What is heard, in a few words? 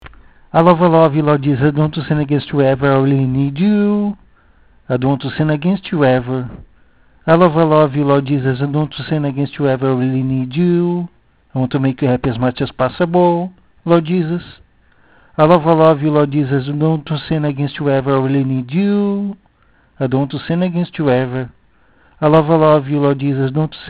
just voice